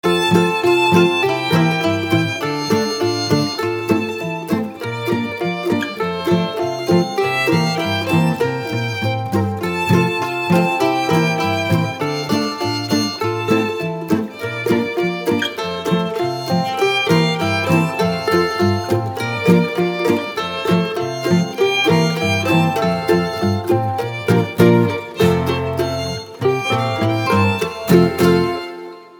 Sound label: Bluegrass; Jolly Old Saint Nicholas